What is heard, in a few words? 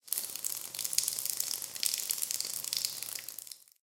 hydrant end; tanks; War Thunder